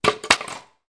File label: Wood panel hitting ground